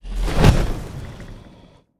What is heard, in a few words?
dragon wing flap